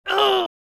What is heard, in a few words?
Man Grunting Painfully